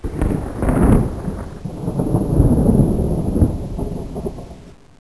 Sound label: thunder; jinx